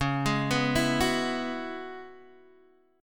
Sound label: Db Minor 11th Db, Guitar DADGAD; Dbm11 arpeggiated; x,4,6,4,7,4; JGuitar